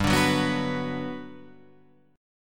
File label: G Major 7th, G, down